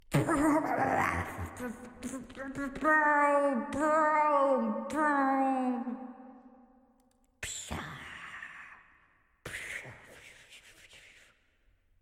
car crash noises

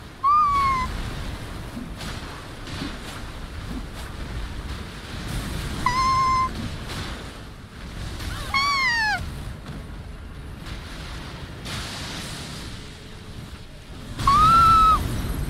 hope noises

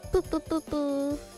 bubububuuuu

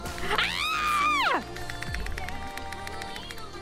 Scream of the death; IRyScream

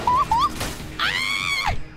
oh hooo AAAAAAAAAAAA; IRyScream

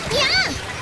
noise

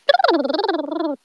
brrrr; noises; SpaceRyS

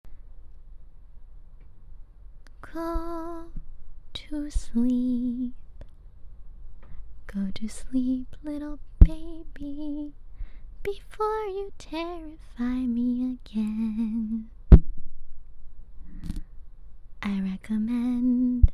lullaby plus tapping, Singing

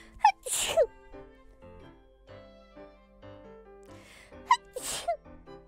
Sneeze

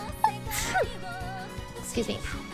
Sneeze

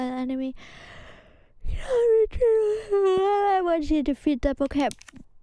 Yawn

